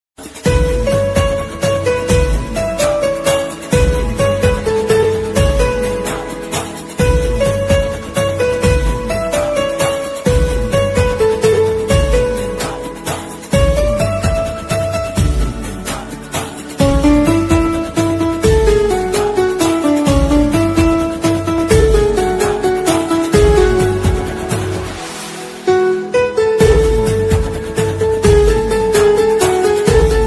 instrumental ringtone bgm ringtone diwali ringtone